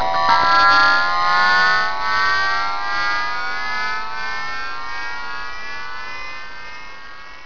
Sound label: ufo; valve; creep hole